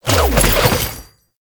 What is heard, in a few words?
Dodge Start; Enemies; Abilities; Warframe; Tau; Dax Ninja